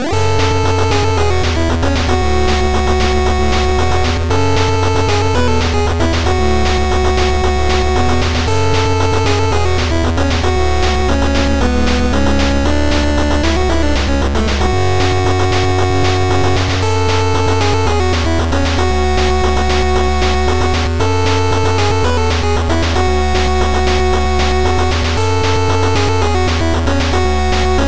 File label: 8bit Blazing Battle